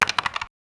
a sound of chess peice

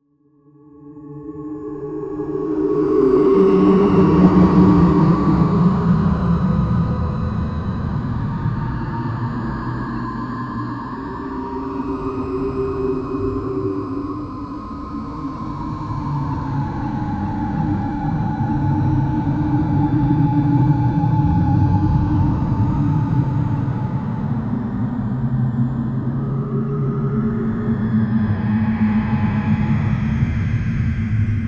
atmospheric sound in the, dark fantasy

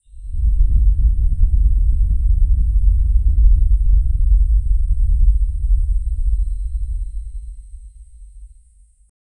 deep resonating hum from

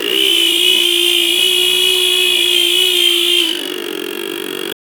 chainsaw sounds short; industry

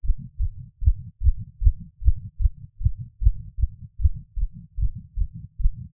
human heartbeat 60 bpm; intimate

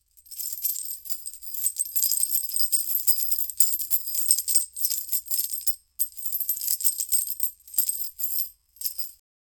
jingle, brazil; amaznia 1849

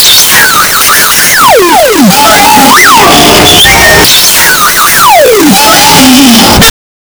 ultra satisfy, collect

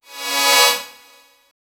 c note cyberpunk futurist

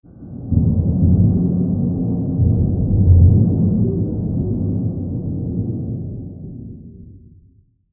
dark mystical javanese

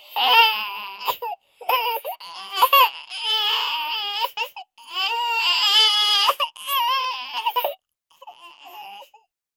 helpless baby cry in pain

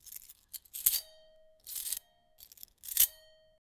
picking up iron rod

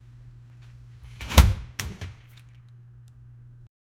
trunk opening; everyday objects

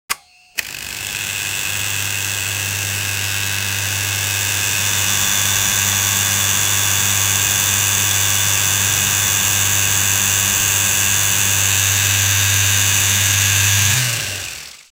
electric engine

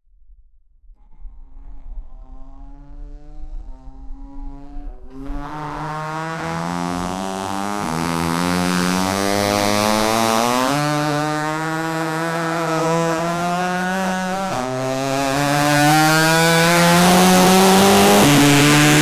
a race motorbike with v4; motorsport

